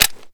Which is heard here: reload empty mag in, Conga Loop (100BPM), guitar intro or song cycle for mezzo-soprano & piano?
reload empty mag in